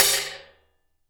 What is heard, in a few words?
Acoustic Crash Damped